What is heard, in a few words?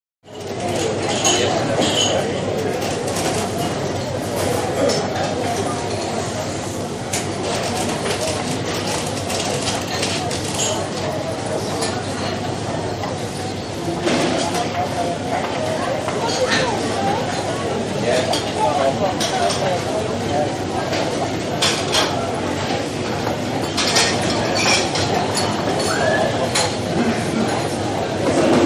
Airport Air, int airport; Airport Heathrow Air